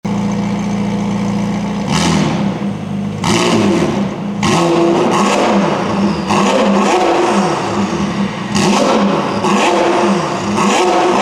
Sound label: 750S XPIPE N DWNPIPES; performance